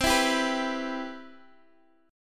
C Major 7th, chord; CM7 strummed

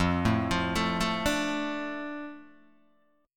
F 6th F down Guitar Open D

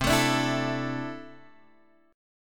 C 6th Add 9th, C, down, Guitar, Open G

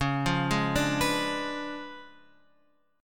C sharp 7th Flat 9th, chord; C#7b9 Chord {9 8 6 7 X 7} arpeggiated

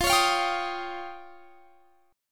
F 7th Suspended 2nd Sharp 5th F; F7sus2#5; strummed

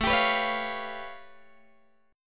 A Augmented 9th A Guitar Open G

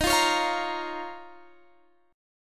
D sharp 7th Suspended 2nd, Guitar, Standard; D#7sus2 strummed; JGuitar

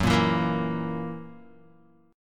Gb 7th Gb down Guitar; strummed; JGuitar

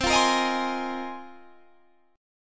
A sharp 7th Suspended 2nd Suspended 4th

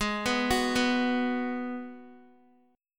Ab Minor 7th down Guitar; Abm7; arpeggiated

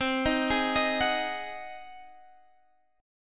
G sharp 7th C

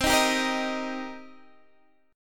C Minor 7th C Guitar Open D

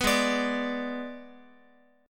G sharp Diminished G sharp, Guitar; G#dim strummed; JGuitar

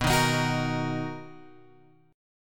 B Major 7th B; BM7 Chord {7 6 X 8 7 6} strummed

